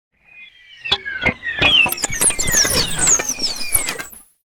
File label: Whoosh, Bow Arrow Shoot, Magical, Surreal, Science Fict; Sound Design